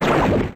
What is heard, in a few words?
bullet off; tf2ware ultimate; mariokart